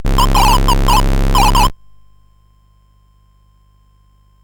Dragonworld sid CSG8580R5

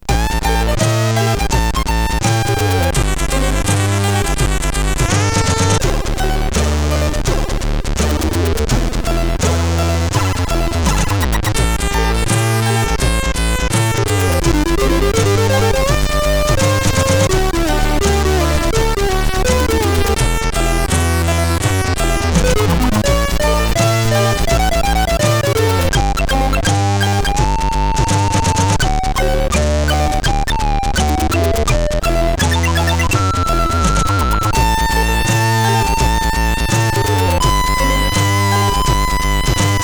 Guitar Work, CSG8580R5; sid

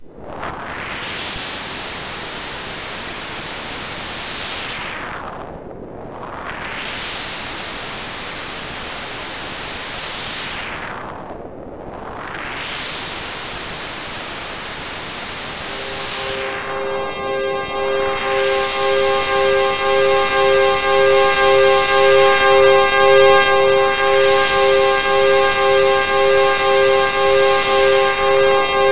Techno; hvsc

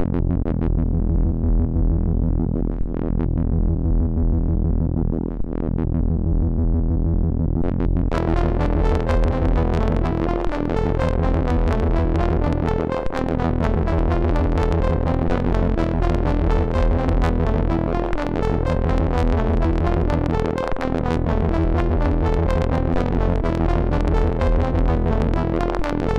X Jingle, MOS6581R2

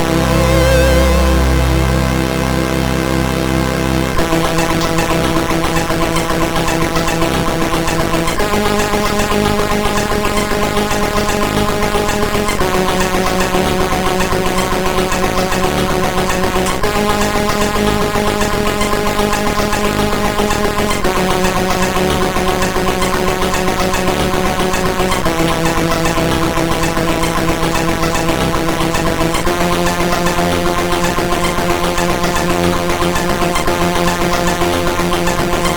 E-Load loader, MOS6581R4; hvsc

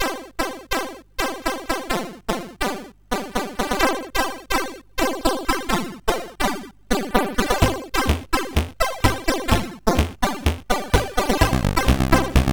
Short n funky, CSG8580R5

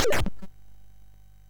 sid MOS6581R2, GAMES; Catalypse

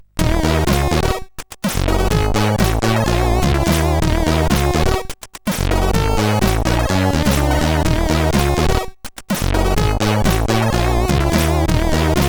Funky Tune, CSG8580R5

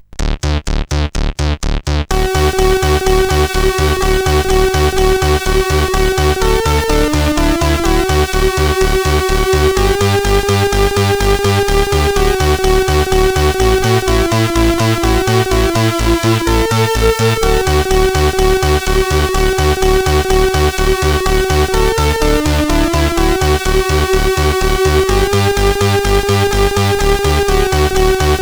Jingle Bells, sid CSG8580R5

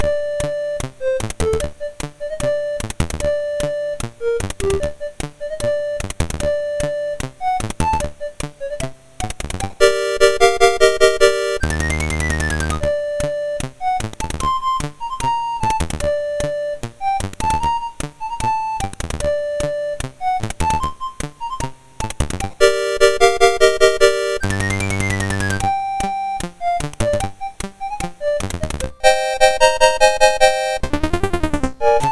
Tango, MOS6581R2

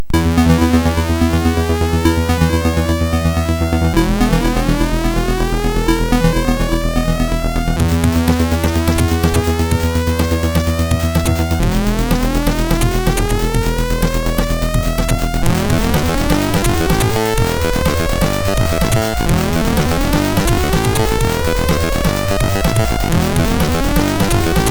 sid, MOS6581R4

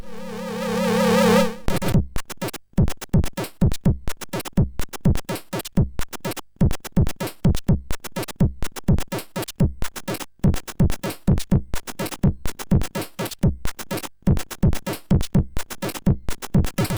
Fat Beats; MOS6581R2

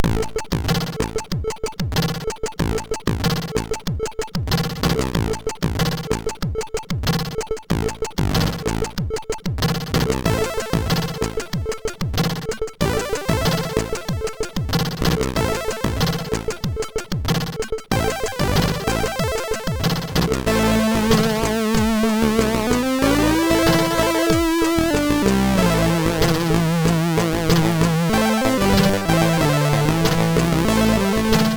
Beat, MOS6581R3; sid

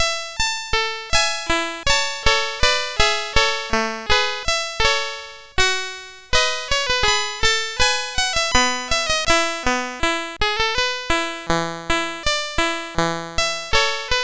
SX 64 Demo sid CSG8580R5; Commodore

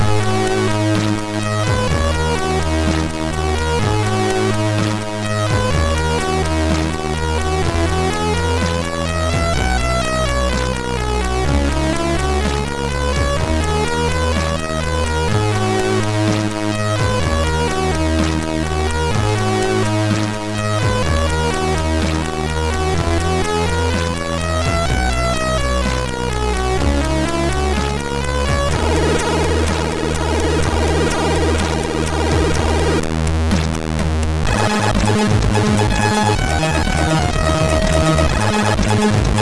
MOS6581

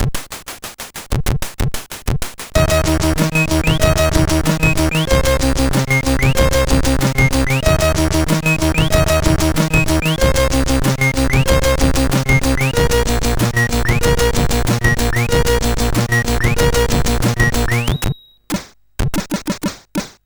Tempo Changes, CSG8580R5

